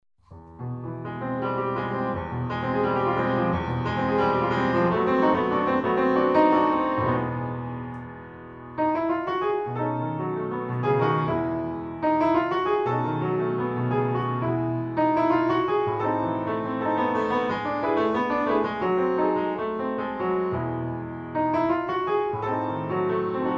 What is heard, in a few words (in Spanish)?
ritmos andaluces garrotin